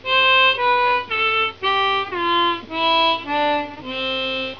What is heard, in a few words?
scale down slow